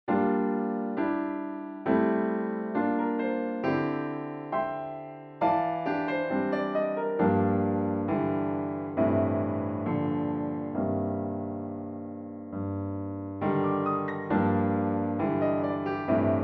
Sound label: play piano play; ravenscroft